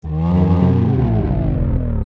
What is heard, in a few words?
Die; ReDead; zelda; OOT